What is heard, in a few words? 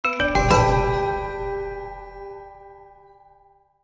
stinger Blessing Upgrade Bronze